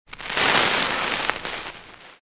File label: boilerplate hit